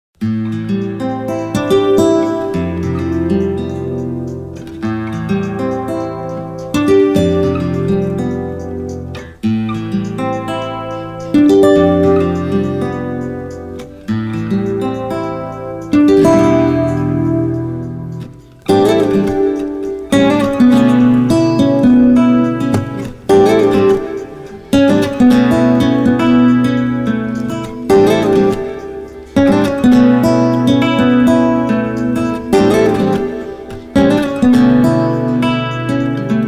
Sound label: rhythm and melody guitars